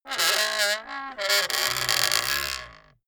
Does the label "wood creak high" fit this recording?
Yes